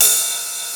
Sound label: TR909 RIDE; East West - Global Sounds